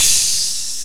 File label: VOX RIDE; RAP CITY 1